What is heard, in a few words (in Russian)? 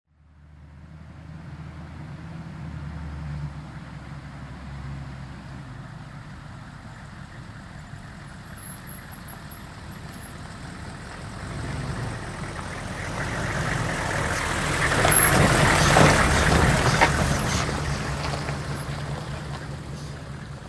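грузовик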